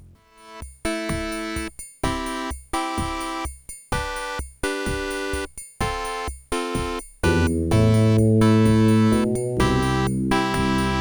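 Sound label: Intro SB CX4235 Speaker Out; Configured for Sound Blaster.; Crystal 3D Sound (CX4235); raptor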